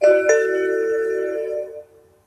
android 4 4 notification; pollux

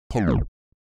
censor

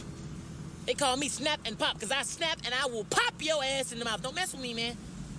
snap and pop

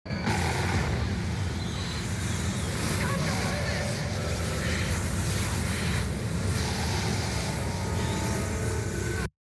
optic energy beam; cyclops

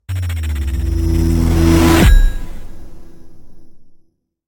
loading screen; gorefield

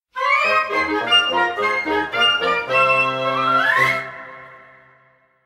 item get level 1; zelda

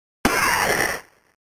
cryings

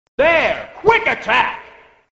quick attack

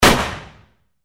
single bang

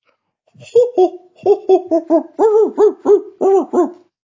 houhohowouaff

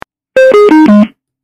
bluetooth device turned off

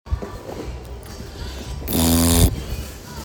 yeaaaa

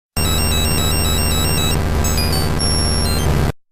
glitch

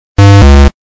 buzzer; shin

